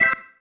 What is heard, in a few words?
Stab, Organ, One Shots